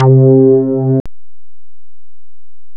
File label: SAX BASS, Synth Bass